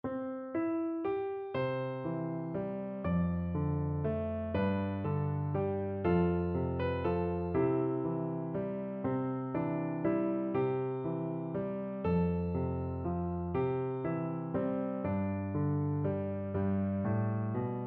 morning has broken, piano duet

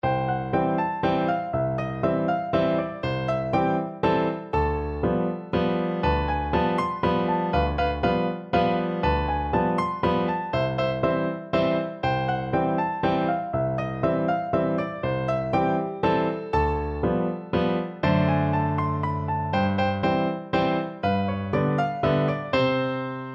strauss fledermaus, piano duet

